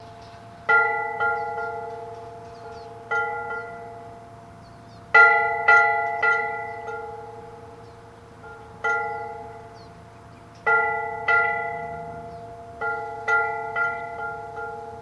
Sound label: Bell Buoy Ringing